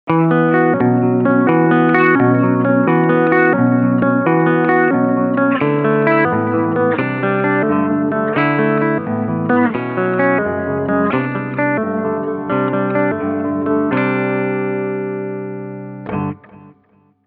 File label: two halftone down